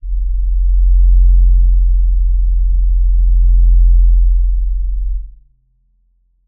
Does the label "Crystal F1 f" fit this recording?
Yes